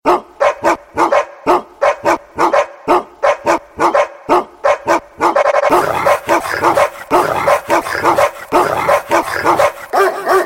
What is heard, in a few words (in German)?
Hund Rap